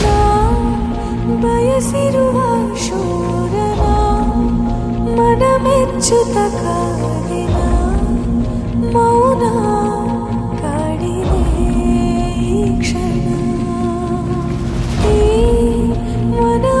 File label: female